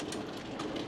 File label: sliding door open